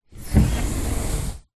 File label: household door patio slide open